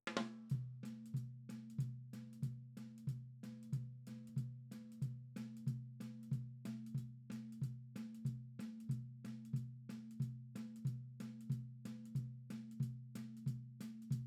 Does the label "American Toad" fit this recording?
No